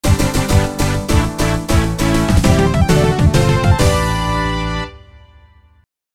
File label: end of level; Sonic 3D Blast Original Soundtrack